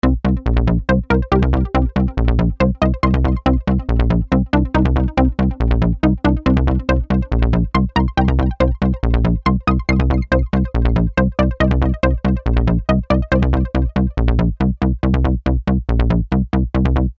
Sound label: Sequence E